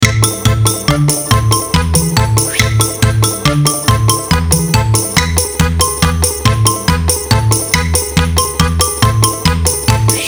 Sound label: Russian Folk Berezka Loop